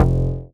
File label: DM BAS, Dance Mega Synth; Best Service